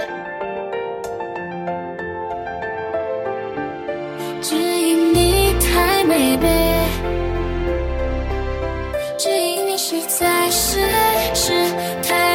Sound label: pop emotional; Pop Emotional Piano; DiffRhythm